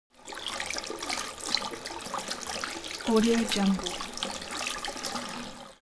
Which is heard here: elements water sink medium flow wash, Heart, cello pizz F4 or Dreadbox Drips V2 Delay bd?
elements water sink medium flow wash